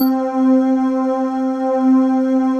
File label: BELL LIGHTS, JV PADS; Pro-Rec - Synthsonic